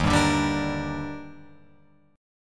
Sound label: Eb Major 7th, Guitar, Open F; EbM7; strummed; JGuitar